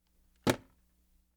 headphones

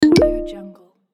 chat notification

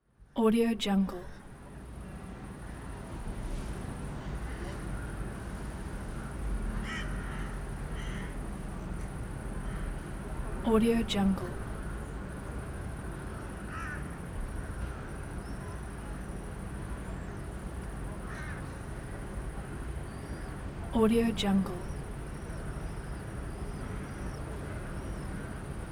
residential area evening ambience

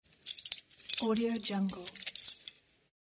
keys; studio-j

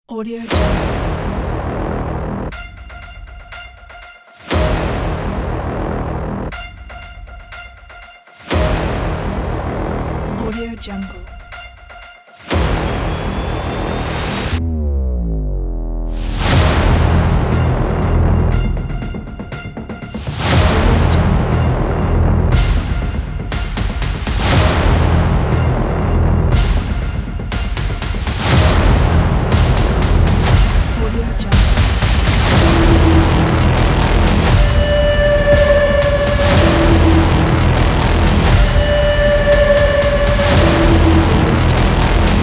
spatial clocks dark suspence trailer

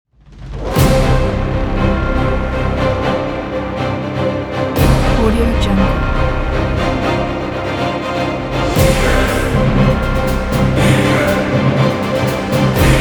dies irae epic trailer ident

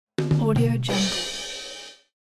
comedy drums

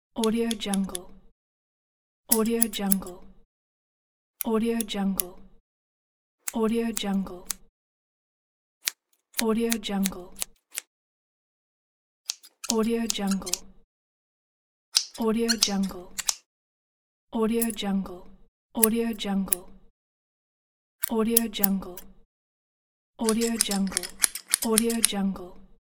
barber

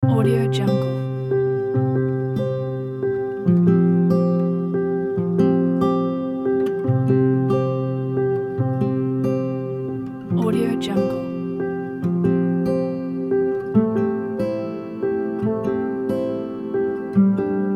piano folk; music-waves-production

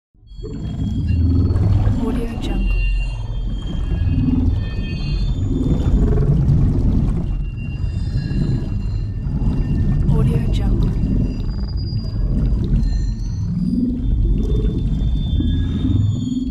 scifi background

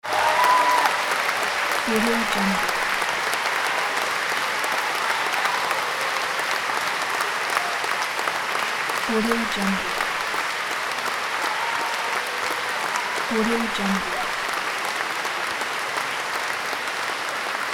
applause

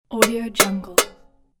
dumb triple metal box hit

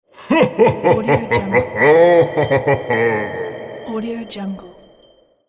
santa clause laughing

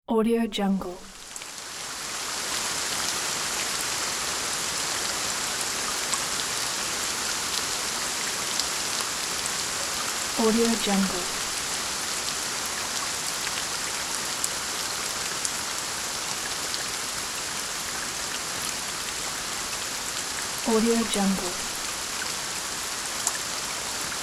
gentle rain

